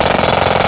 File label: JAMMER